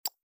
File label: click